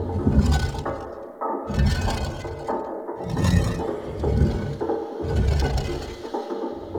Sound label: Boulder Broken Loop; Ambience; Props; Warframe; Infested Eris